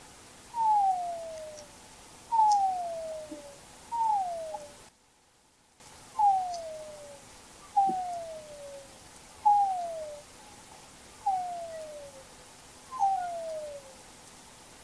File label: Humpback shrill woo call train